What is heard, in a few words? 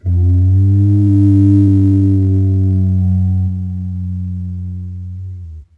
Brach Call, Dino